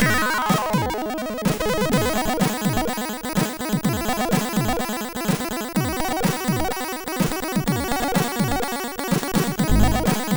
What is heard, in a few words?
sid, MOS6581; Visual Dance; MOS6581R4